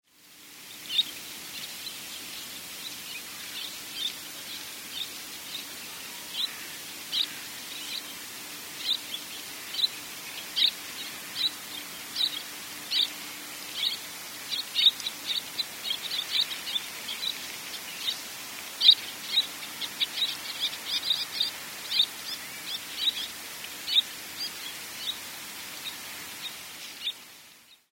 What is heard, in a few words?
koajisashi; コアジサシ; 地鳴き; 2014年5月18日 神奈川県川崎市